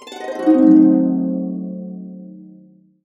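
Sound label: C diminished 7, harps; USB Soundscan vol.62 - Complete Harps; AKAI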